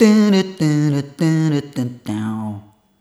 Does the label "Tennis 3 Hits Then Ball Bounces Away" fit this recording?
No